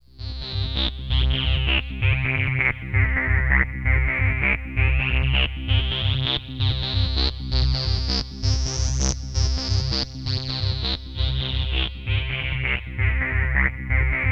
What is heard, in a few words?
C1, Korg MS-2000; Synthworx